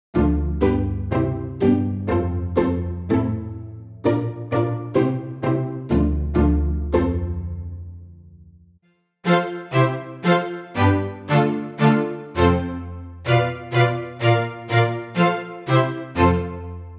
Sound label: Violinish